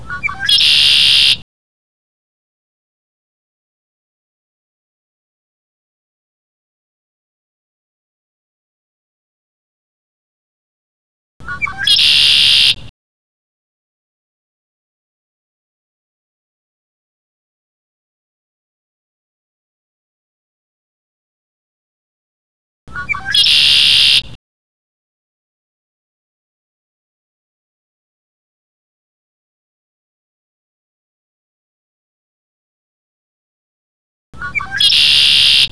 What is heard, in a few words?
conklaree 12 songs 10 sec between, bird songs of WA; conklaree PB 12songs